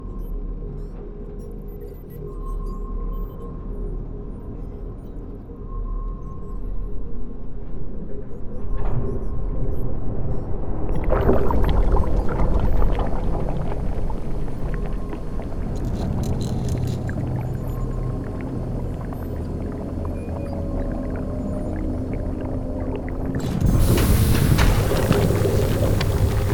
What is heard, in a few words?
Pokevat Ambience LOOP; pokemon clone labratory